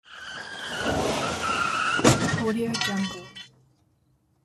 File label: car crash